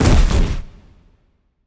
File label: bodyfall; smite; khepri